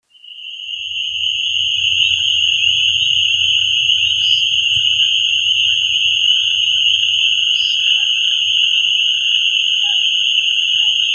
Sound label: Peepers